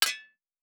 Blacksmithing, Crafting; Survival Game Sounds - Lite Edition

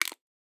Can Twist; Survival Game Sounds - Lite Edition

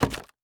Build Interface; Survival Game Sounds - Lite Edition

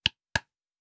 Nail Wood, Crafting; Survival Game Sounds - Lite Edition

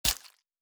Stab; Survival Game Sounds - Lite Edition; Combat and Hunting

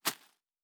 Leaf Run Surface, Footsteps; Survival Game Sounds - Lite Edition

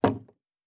Wood

